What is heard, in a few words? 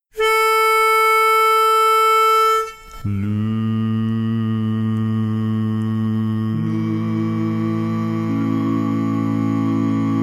Star Spangled Banner, Lead missing